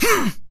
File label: Melee Grunt, Human Male; zps; HumanMale-01; MeleeGrunts